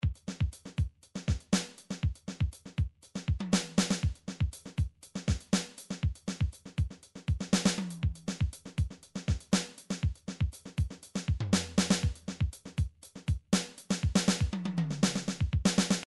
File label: midi drums drums funk